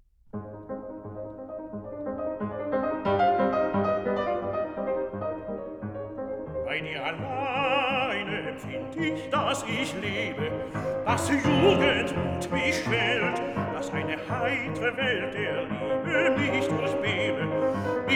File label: refrain song for voice & piano; Schubert; Bei dir allein